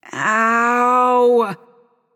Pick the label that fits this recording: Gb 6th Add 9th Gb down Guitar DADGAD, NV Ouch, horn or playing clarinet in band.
NV Ouch